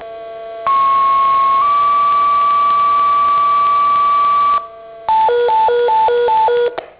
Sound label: tone